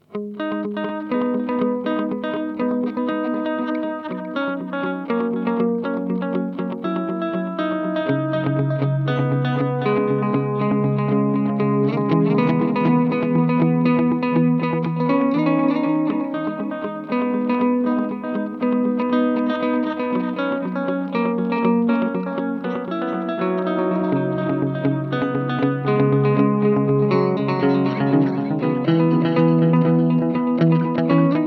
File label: Boss SDE-3000D parallel